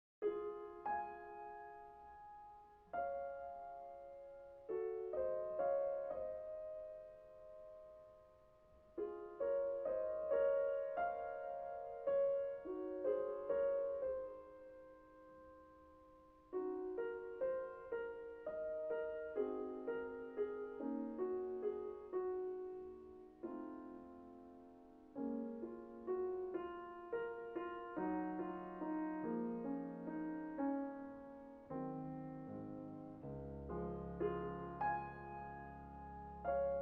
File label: Piano; Clair de lune; C.Debussy